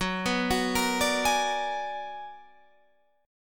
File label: B-Minor Major 13th